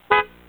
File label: horn, prius